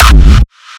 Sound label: Gated Kick, raw hardstyle kick; raw hardstyle kick pack 1